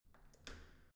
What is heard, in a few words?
entrance door handle up soft